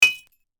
Glass impact no break

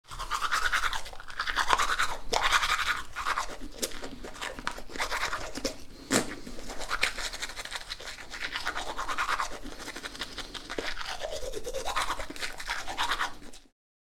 Teeth brushing quickly woman

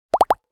Cartoon accent popping

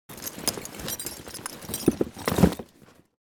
Objects office cardboard box handle

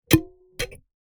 Garden hose nozzle pressing; hand tool

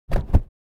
Car door open interior

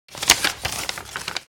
Folding paper short

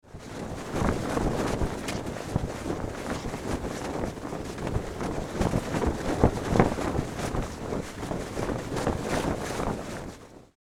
Ship flag flapping flag pole no wind

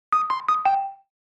Electric marimba notification

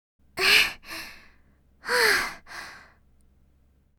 Heavy breathing anime girl vocalization

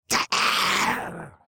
Demon beast imp monster sigh surprise

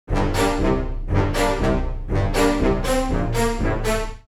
Cartoon funny transition comedy dramatic

